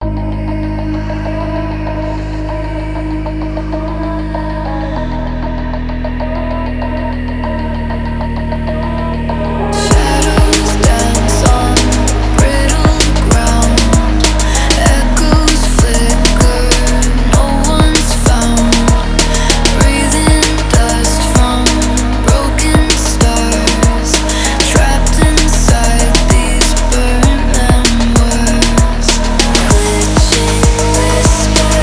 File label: beats whispers